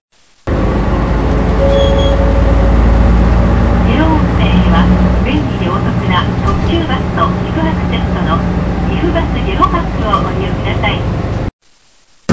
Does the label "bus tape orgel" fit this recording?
Yes